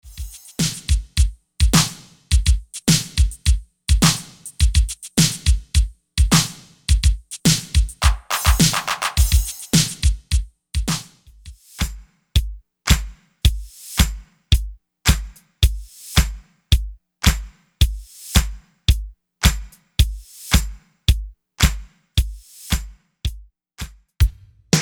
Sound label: 80s drum beat